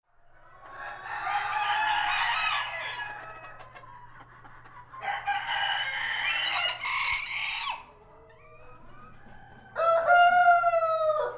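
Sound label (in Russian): животное звучит больше петуха ворон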